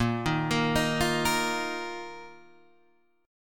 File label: A sharp Suspended 4th A sharp down Guitar Half Step Down